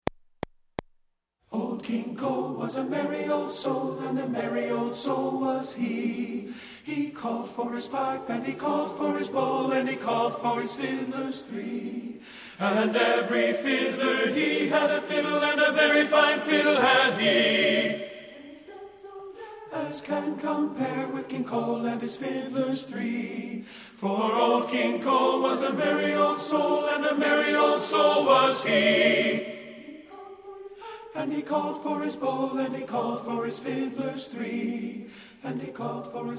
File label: TENOR; satb